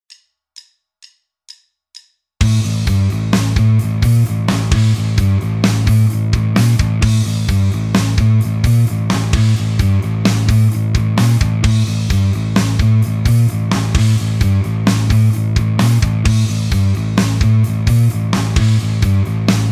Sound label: backing; Guitar Techniques 2018 Issue 280 April